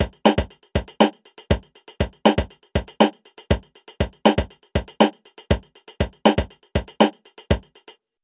Beat SM57

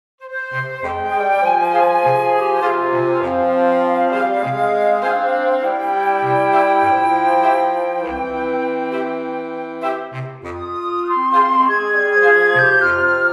BBC Woodwinds; Spitfire Audio

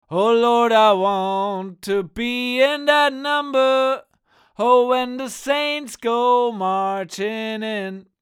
Vox U67 Neve1073

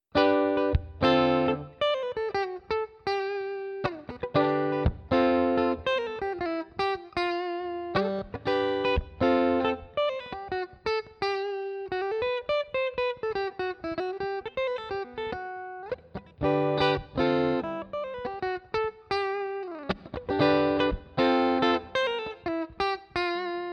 clean bridge SC

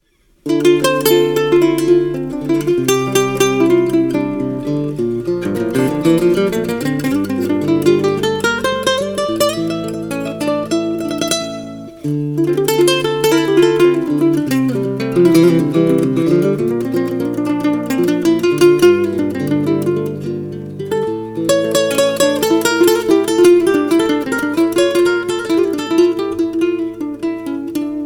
Spanish Guitar Duo

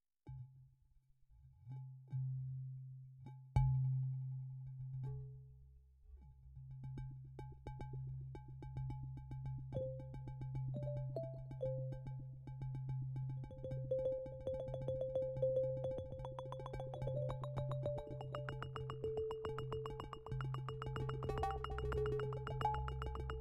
OSMOSE Zenpercs; EXPRESSIVE E

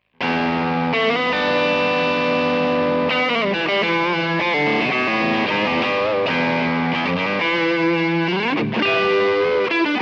Tube Driver Warm; Harley Benton Dual Effect Evil Twin

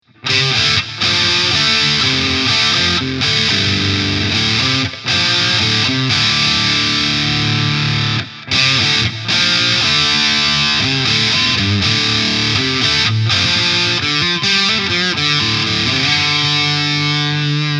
50 gain; Phoenix

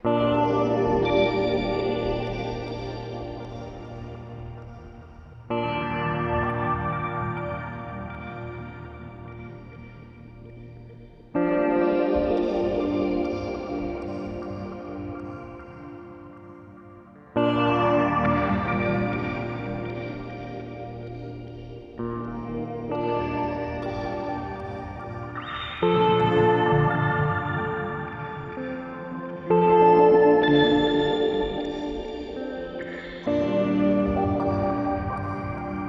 death by audio rooms polymoon peak

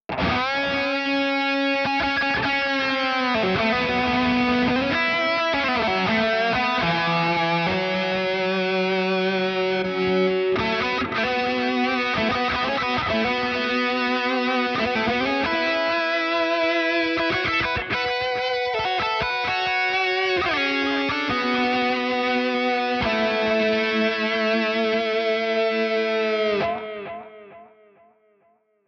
Headrush Core Lead HRM Lead HRM Lead

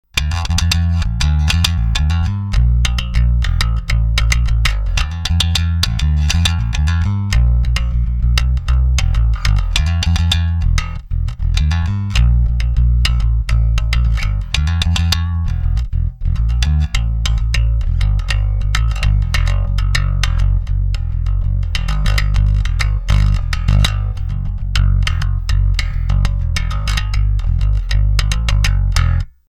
Rickenbacker 4001 Extremsound No Mids; BBE; Comp Maximizer

